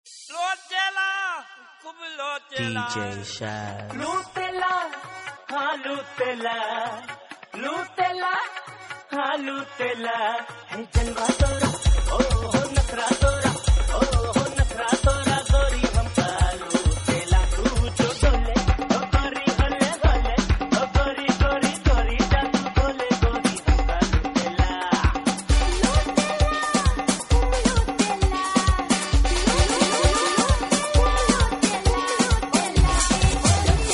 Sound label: Hindi Pop